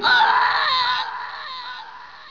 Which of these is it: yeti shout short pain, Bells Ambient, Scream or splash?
Scream